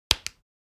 Large button

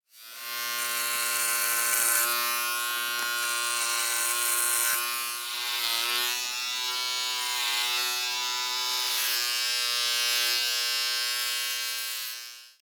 Barber razor haircut electric hair clipper

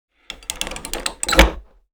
Heavy complex lock rotating mechanism; locks keys

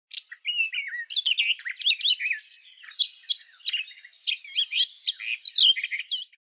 Barred warbler chirping

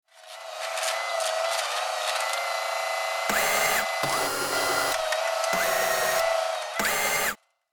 RC excavator toy digging; rc toys

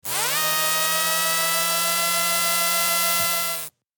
RC truck electric motor whirring; rc toys

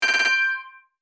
Digital interface short scroll menu ui

